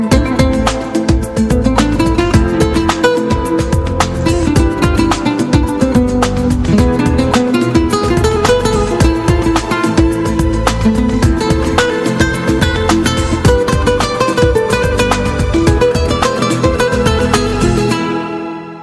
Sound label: Superb Ring Tone